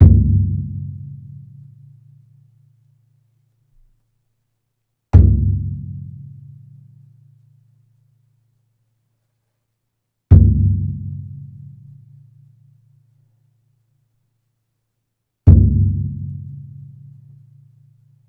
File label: Painted drum; Stars (Tachenump)